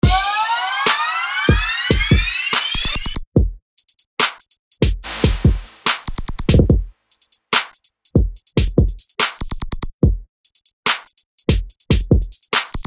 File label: dirty south hits